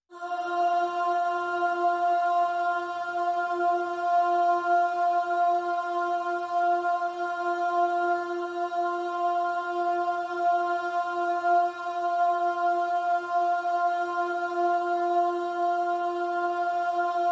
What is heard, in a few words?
Pop Vocal F